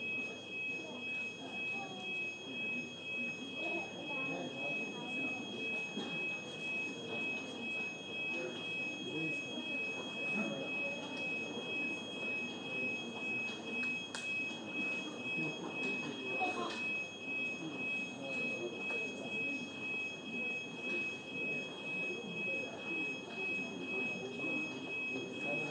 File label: alarm on Dublin airport T2